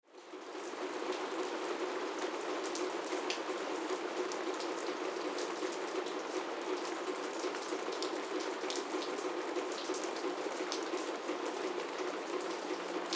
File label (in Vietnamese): tieng nuoc mua dot mai ben trong can gac xep; con mua